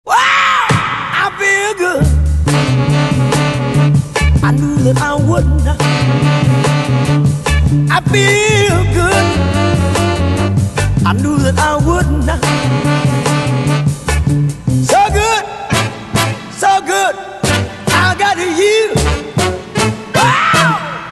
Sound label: SMS Ringtones funny and crazy